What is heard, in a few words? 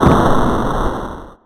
Explosion; Megaplane